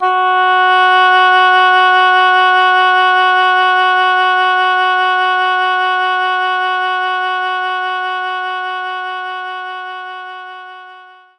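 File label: Oboe F sharp 3; knights try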